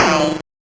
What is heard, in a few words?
Noise; musicradar; 8-bit bonanza